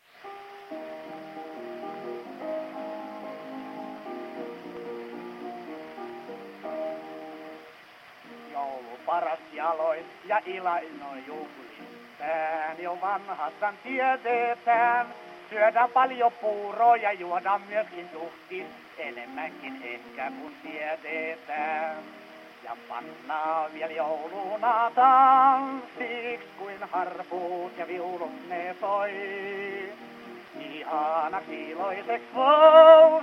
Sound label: Gramophone